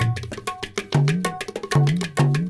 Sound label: INDIA L; Spectrasonics - Heart of Asia CD2